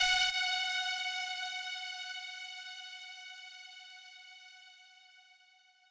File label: lead